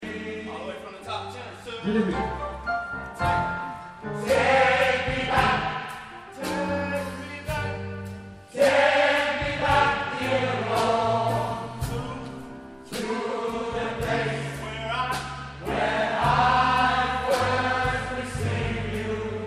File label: tenor